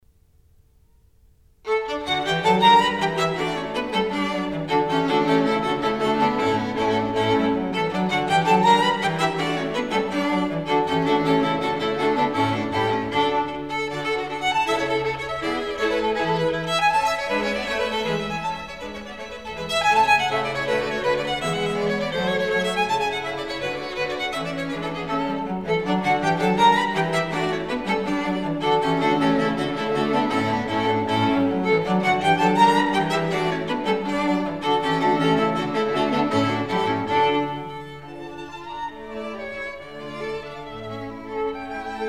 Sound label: String Quartet No 2 in D - Molto allegro; Mozart; String Quartets